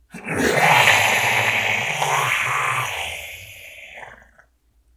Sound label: pain, voice; garrysmod